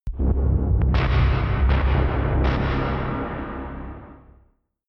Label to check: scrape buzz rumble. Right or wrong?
Right